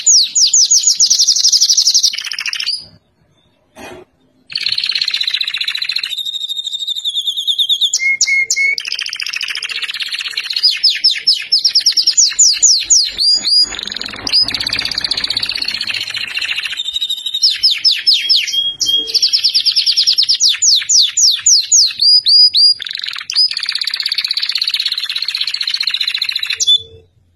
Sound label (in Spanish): ringtone pajarito